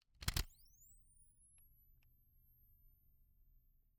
Camera, Kodak Extramax, pocket, 110 film, plastic, metal, flash, switch on, power up; Eiravaein Works; Vaeyan IV